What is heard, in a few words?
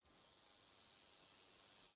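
Pets Insect Large Idle; creatures; Lego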